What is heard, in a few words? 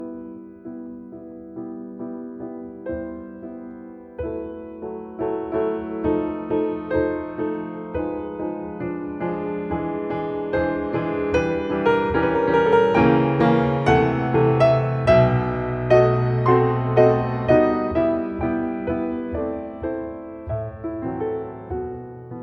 Prelude in E minor; Op.28 No. 4; F. Chopin